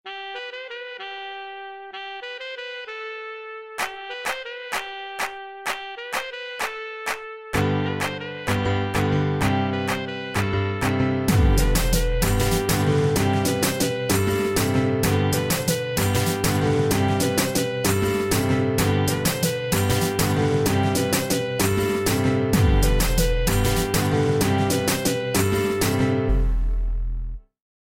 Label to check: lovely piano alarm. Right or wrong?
Right